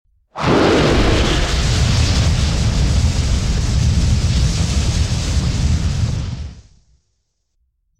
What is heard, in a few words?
fire Spell; LegendsOfCoyoteGulch